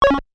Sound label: Nintendo Sound